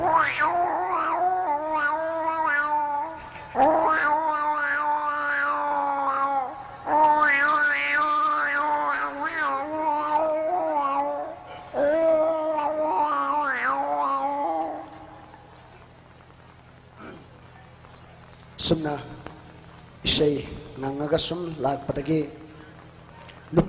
singing cat

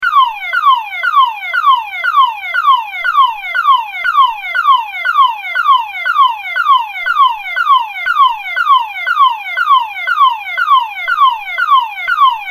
emergency alarm

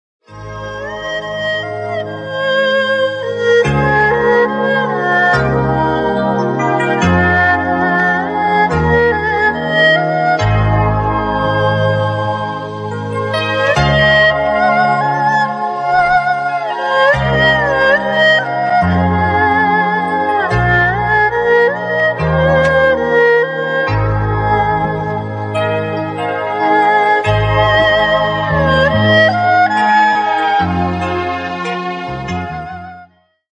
Chinese instrumental music

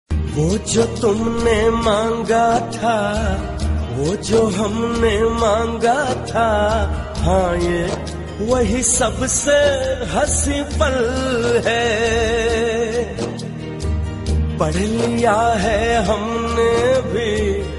Sad song; Ye Rishta Kya Kahlata Hai

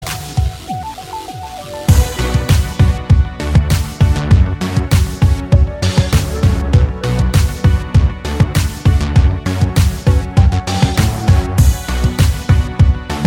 instrumental

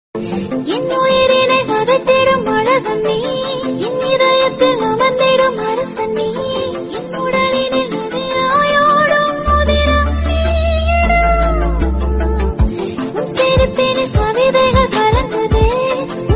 Tamil Love Cut Songs In Female Voice

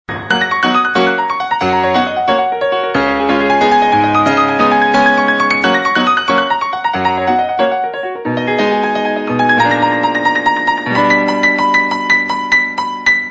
Chopin secret piano

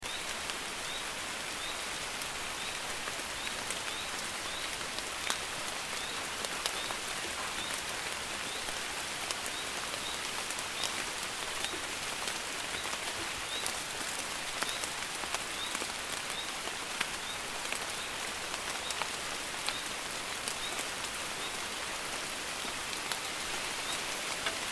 rain falling sound

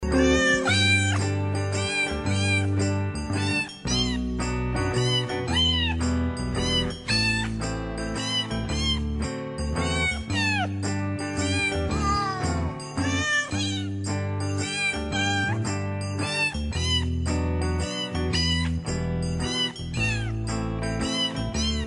cats and dogs singing christmas songs

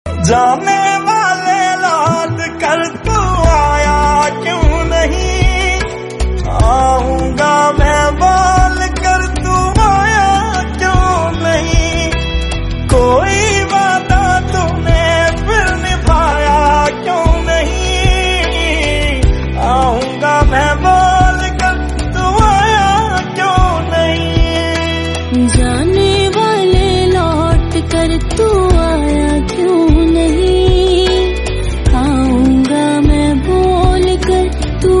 SAD SONG

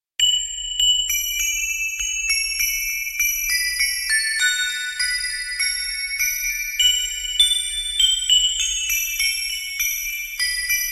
Wind chimes instrument

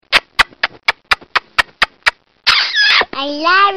kiss, kissing sound effect

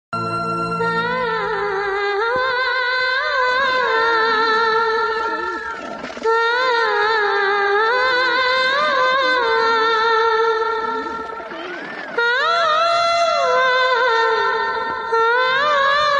Instrumental

